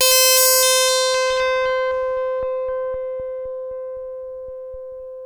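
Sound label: sample and hold G1; Best Service Dream Experience; S+HOLD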